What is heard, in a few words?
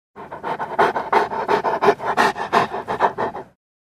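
Dog St Bernard Panting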